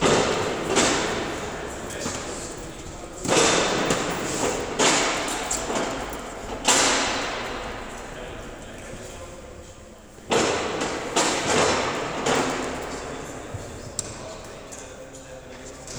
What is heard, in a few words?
foley